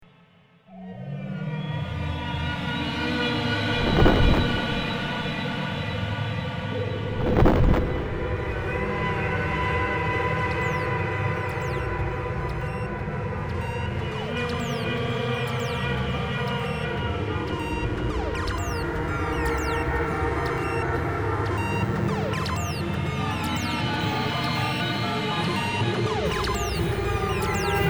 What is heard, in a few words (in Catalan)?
musica mixdown